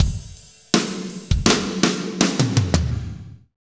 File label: Drumscapes Roland, Pwr Ballad; Northstar - Drumscapes Roland